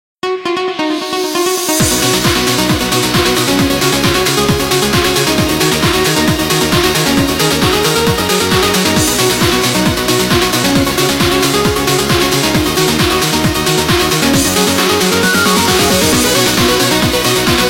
Nokia Ringtones; 7390 Southeast Asia

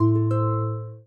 pure music up; Realme; C2; ui

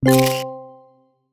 camera click; Amazon Fire-Max-11

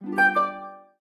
Letter, notifications; Samsung; Galaxy J4 Core

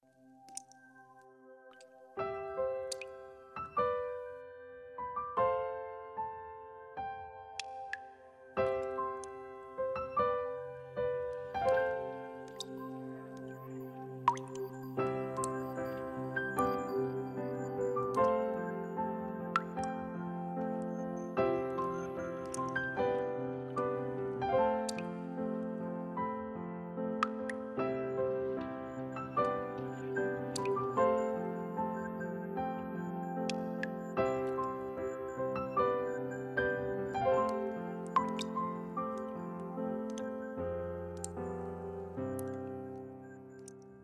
Piano, alarms; Tecno; Spark Go 2023

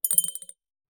Glassy, notifications; Yota Yotaphone 2

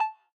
Video Record, ui; Tecno; Spark Go 2022

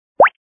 Pop Up; Kyocera; KX2 Koi

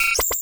notifications; RED Hydrogen One

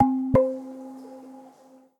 Stream, notifications

